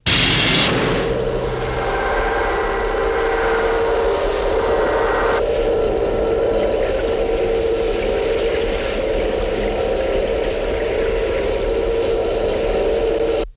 Torpedo Jet By, torpedos

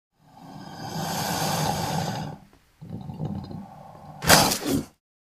Alligator Growl Snap

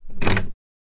Door Knob Grab; door knobs

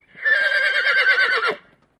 Horses 1 High Pitched

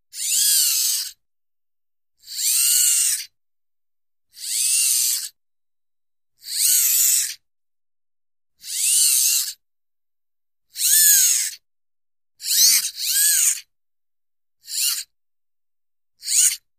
Electric Motor Revs

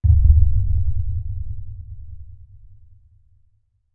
Bass Impact Low Tona; impacts

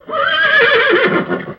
Horse Whinny Neigh

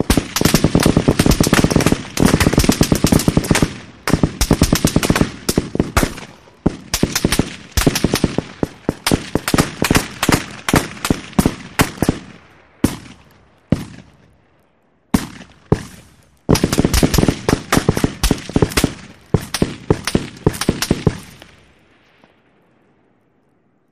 Artillery Fire Modern War Background Sequence

